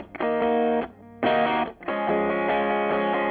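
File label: Fathead far, Electric Guitar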